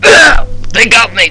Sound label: man dying near; Bolo